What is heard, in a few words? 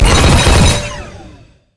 carpetbomb; rumble skin03